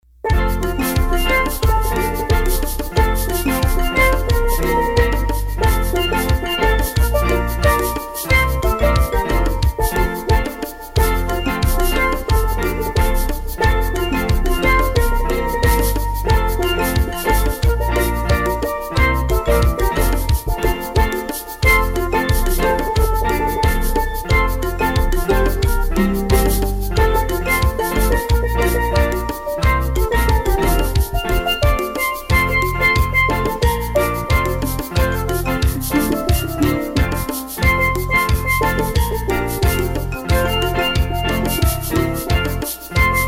Steel; Swing

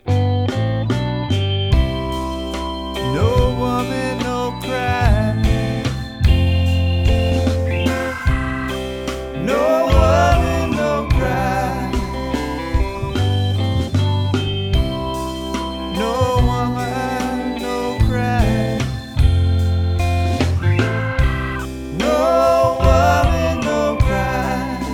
Reggae

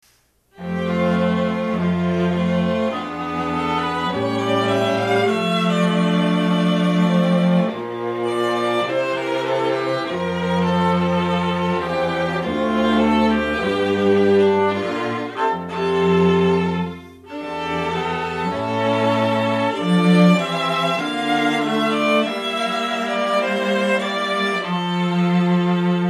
string quartet

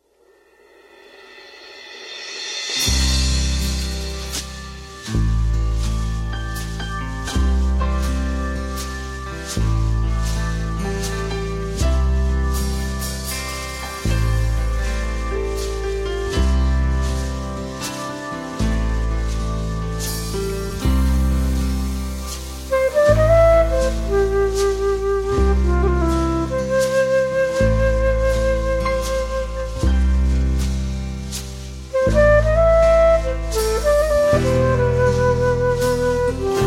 Flute; David Turner Music Ensembles

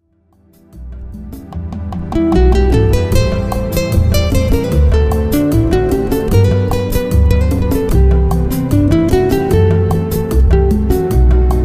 backing tracks, Guitar

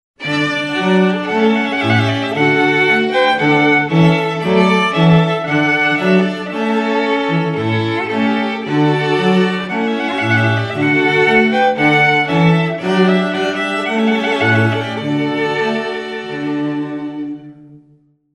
String; Four Seasons; Trumpet Voluntary; Classical

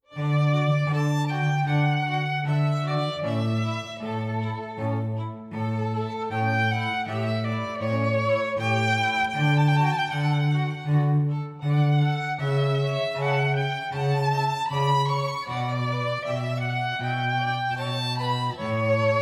Violin trumpet cello trio; Winter

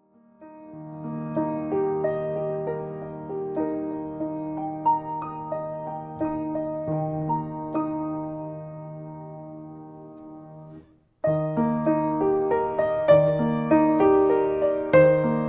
Jazz Piano

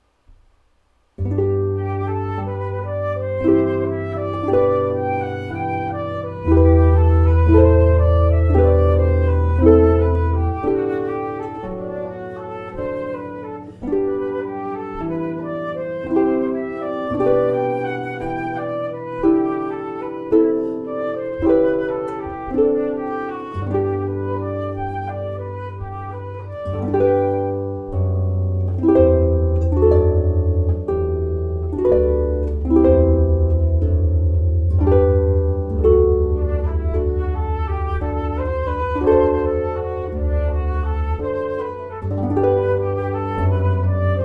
flute and harp; Jesu Joy of Mans Desiring

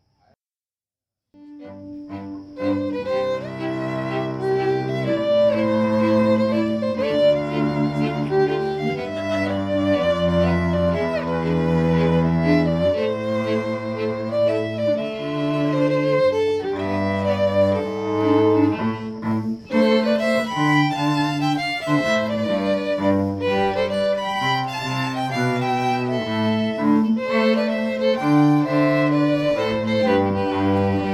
TRIO

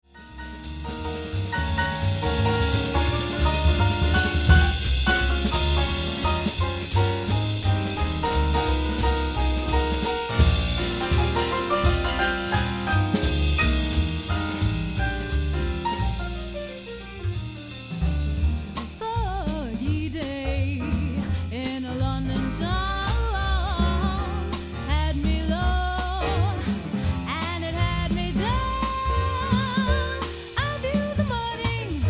Jazz Swing

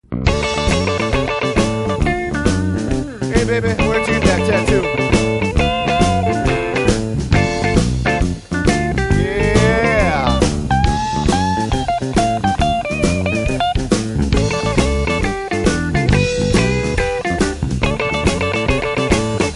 Motown Rhytm and Blues; Jazz Classical Rockband

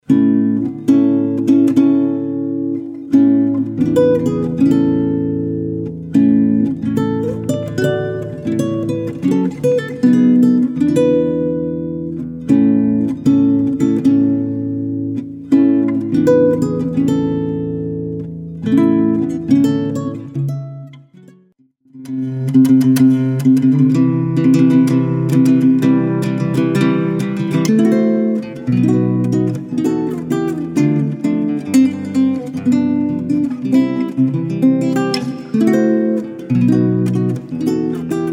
Bridal Chorus And Wedding March, classical guitar

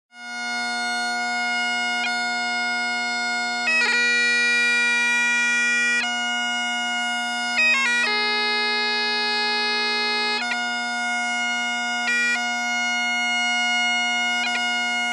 Piobaireachd, bagpipes

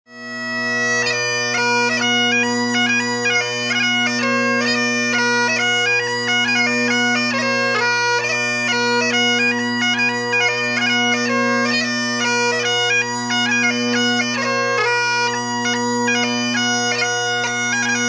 Strathspey And Reel, bagpipes

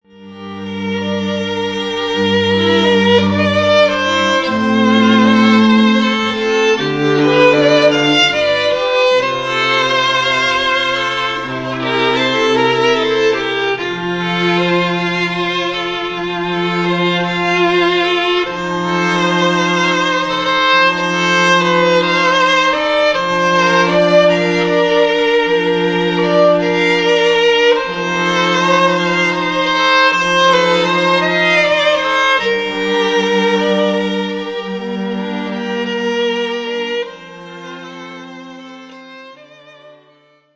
Classical, String Quartet; Ave Maria

